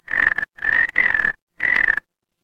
animal frog

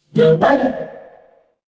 ui app open

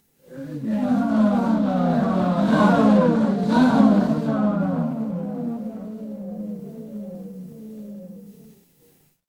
crowd gasp

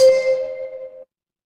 door doorbell

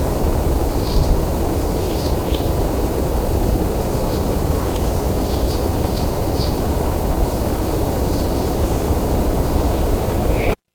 ambience forest

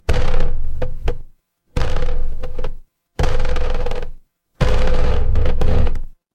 horror creak

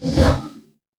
ui delete

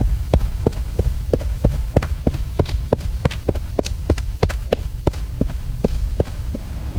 footsteps concrete

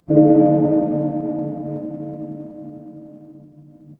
instrument gong

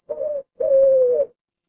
animal owl

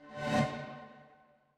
transition riser

